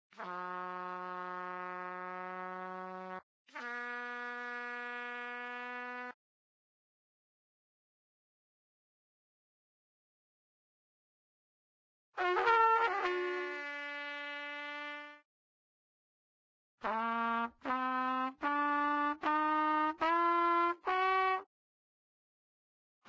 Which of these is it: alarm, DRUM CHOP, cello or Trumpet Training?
Trumpet Training